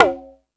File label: Hip Hop, Drum Machines